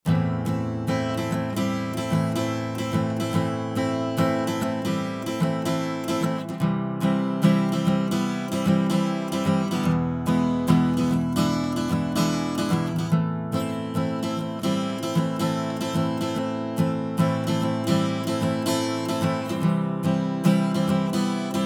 Leveler Gitarre Soft 2 zu 1; Fredenstein Artistic

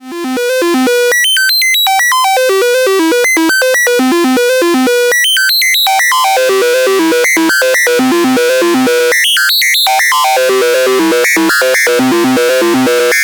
uniCycle Lineare FM

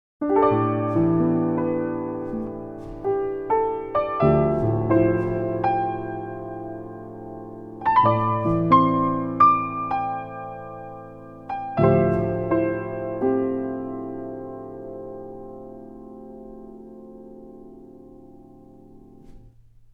Klavier dry

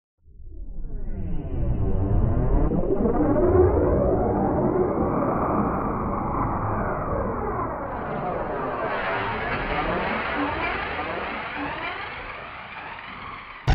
KORG wavestate Werksound Stereo Out; Thru Wormwhole

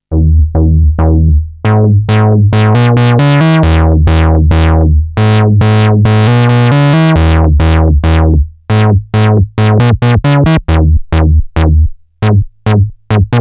Initiate Envelope das Decay ist nicht zackig genug

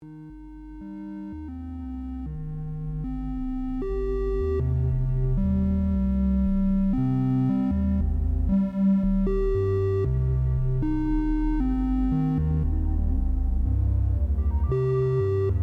Zufall in Tonhoehe und Klang; DOEPFER A-149-4

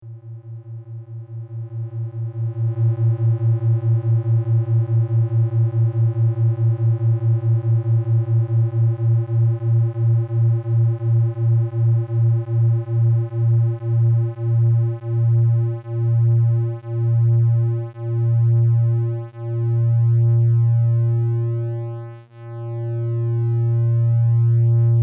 zwei Oszillatoren stimmen

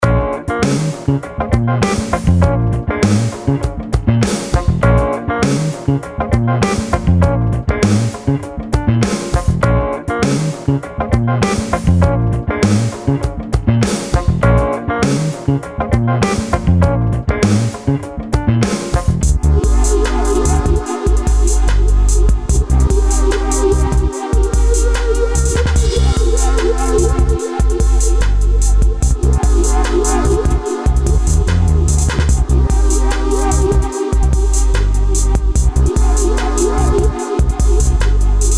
sequenzen

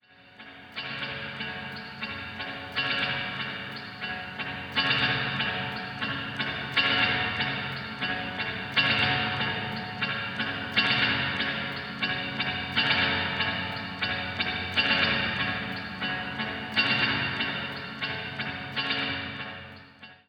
Vermona ReTubeVerb elektronisches

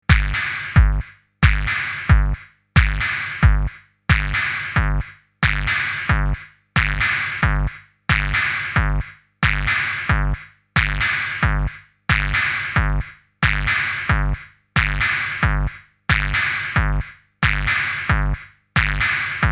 Drums und Bass; Stramp Synchanger

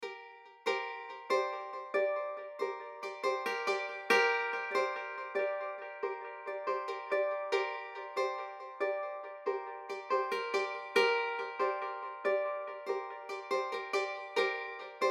Gitarre Delayed

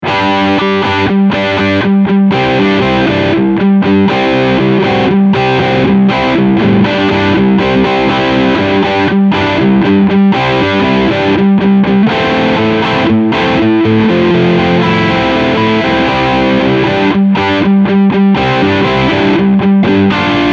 Crunch beide PUs

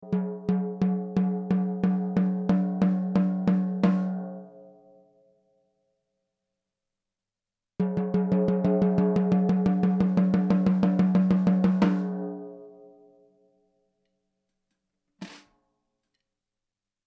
14x8 Mahagoni Snare Recordings Mittel

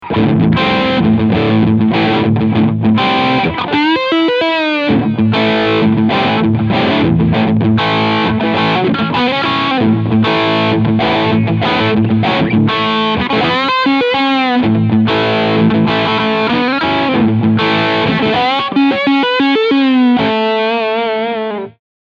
Reverend Flatrock E Gitarre Overdrive Steg PU

